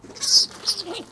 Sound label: striped skunk; animal sounds